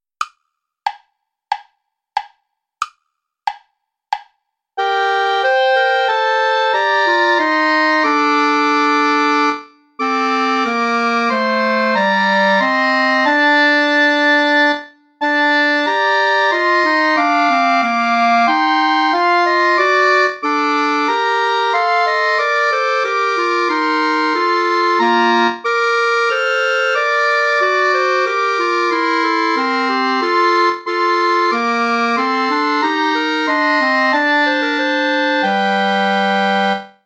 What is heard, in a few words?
play along without clarinet; bach johann sebastian; soll ich fliehen hin